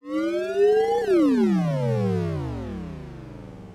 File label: hoverboard drift dry